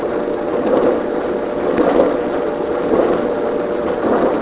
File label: dryer